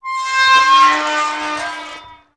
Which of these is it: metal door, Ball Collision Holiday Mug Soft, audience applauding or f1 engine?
metal door